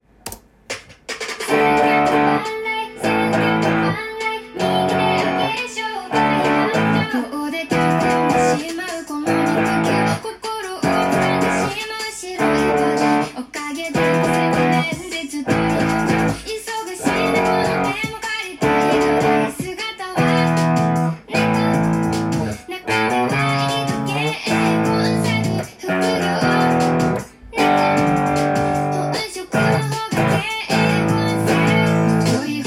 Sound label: manekineko guitar